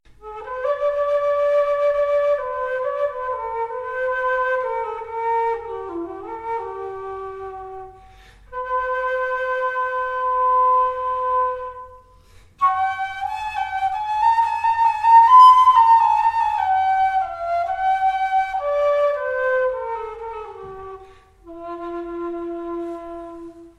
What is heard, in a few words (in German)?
Quena F Alt